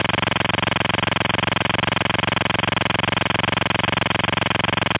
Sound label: iDEN Mono